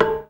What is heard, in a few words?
ZIMBAL DAMP; Best Service; World Colours